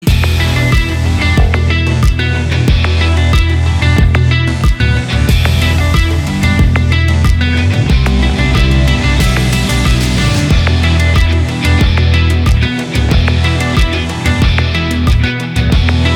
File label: Guitar Bgm